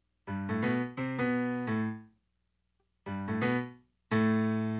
PNO, Hip Hop and R&B Drumloops; Best Service ProSamples vol.01; AKAI

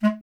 B. Clarinet

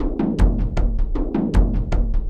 Frame Growing, Drums, Frame, Kendang; Sonic Foundry (Sony Creative Software); Friendly Fire

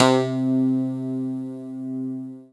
CLAV; East West - Phat & Phunky CD2; 90's Sample CDs

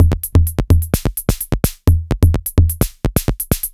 retro drum machine Loops 128bpm; Tape B; SR88

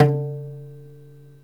GAMBIAN BOX; GAMBIAN BX; Heart of Africa

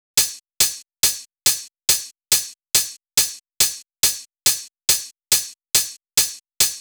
drum Loop 140BPM OH; Vengeance - Essential Clubsounds Vol.5; VEC5 Drumloops; VEC5 Multi Loops 140BPM

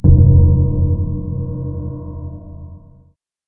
Impact; impact samples

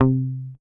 HOFNER MUTE, Bass

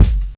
Kick, Kickdrum; Equipped Music; Loungin House

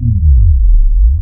BASS SUB; BASS SYNTHES; AKAI; Big Beat Samples & More

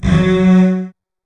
DOUBLE BASS; ILIO; Kirk Hunter Virtuoso Strings VOL-2; 90 s Sample CDs; 5DOUBLEBASS

